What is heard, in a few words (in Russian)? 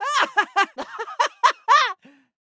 Смех Фраза; Лусио